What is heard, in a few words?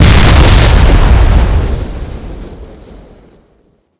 hegrenade explode; cstrike; grenades